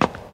stairs land; Golden Crayon Sounds

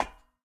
ladder step; Golden Crayon Sounds

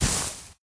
brush step; Golden Crayon Sounds

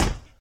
tile land; Original TK Sounds

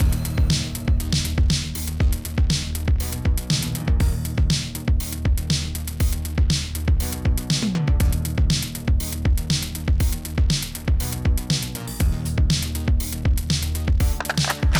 menu mus; Original TK Sounds

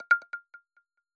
Alert Prominent; iOS Sounds; NanoAlert10

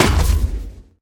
ladder hard land; Original TK Sounds

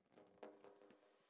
Happy Birthday Sound Effect; iOS Sounds

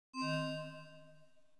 scrollable exit, Old TalkBack Sounds

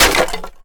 wall debris; Original TK Sounds

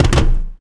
metal fall; Golden Crayon Sounds

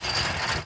bike fence; Golden Crayon Sounds

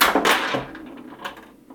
bingo ball; RS Games Sounds

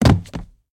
wood fall; Golden Crayon Sounds

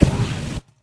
flamethrower; Original TK Sounds

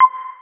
Secure Character; Business skieme Beta 1; Sound Schemes for iOS Archive

